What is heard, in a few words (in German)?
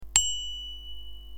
Fahrradklingel; Auf den Punkt